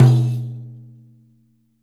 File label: DAIRES OPEN, Mediteranean Traditions percs